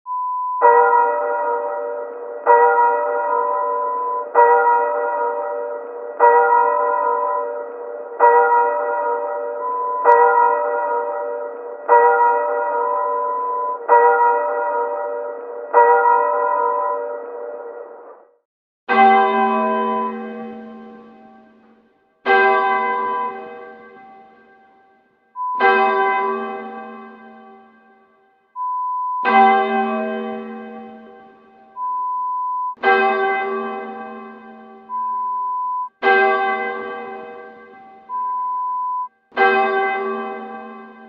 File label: Church Bell Toll Horror Sound Effect